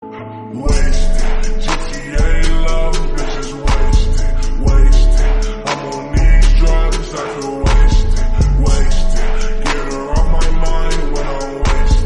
slowed reverb

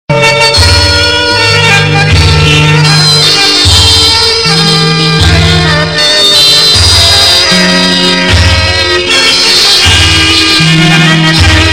pashto song instrumental

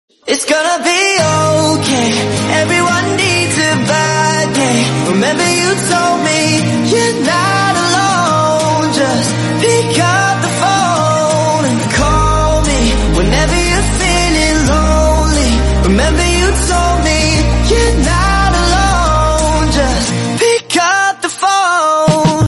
english

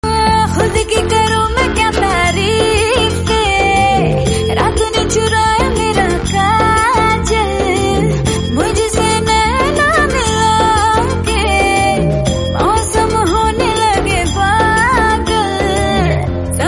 hindi; baaghi

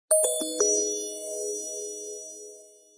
short sms

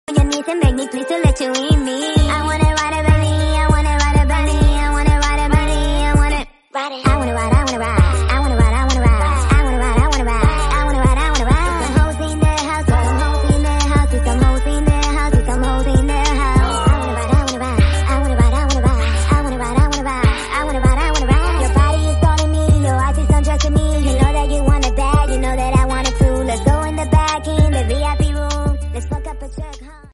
english remix

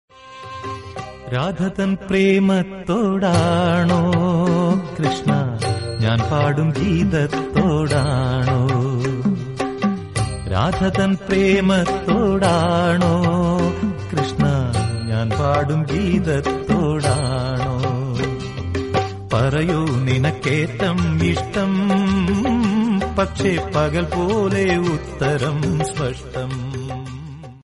song

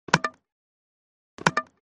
cricket wicket bowled sound

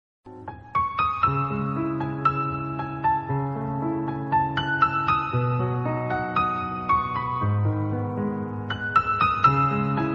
piano instrumental

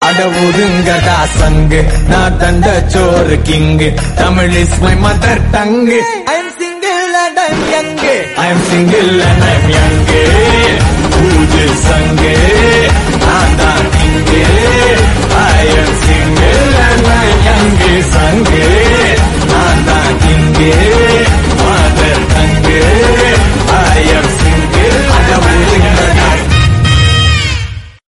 song tamil

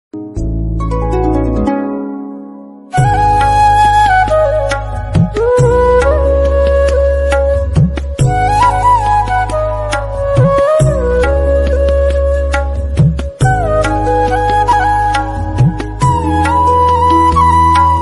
flute

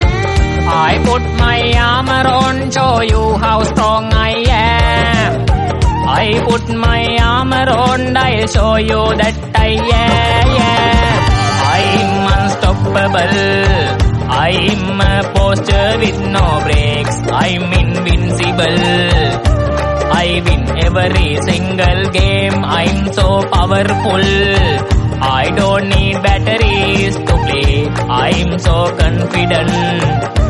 cover english song

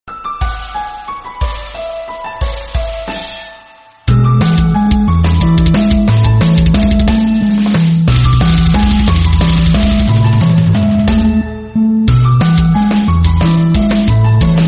nokia dnb remix

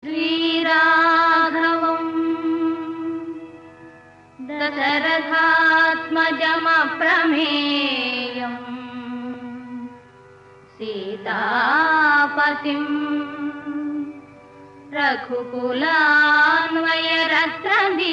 bgm telugu; jai lava kusa

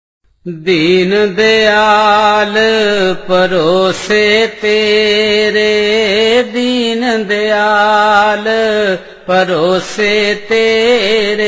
devotional malayalam

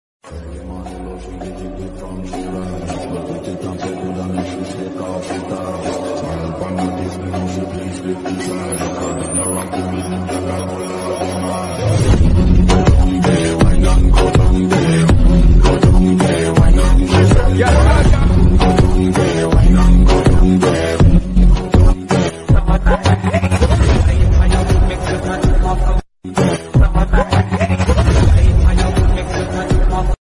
bad boy attitude bgm

